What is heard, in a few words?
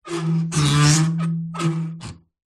foley metal tap rub squeak resonate; zapsplat